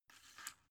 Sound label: drug blister pull out soft